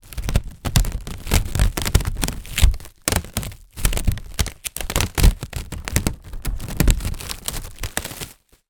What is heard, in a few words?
household cling film stretch movements; zapsplat